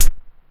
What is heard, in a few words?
C HH FX, Drum & Bass, 160 BPM; Ueberschall; Drum & Bass Resonance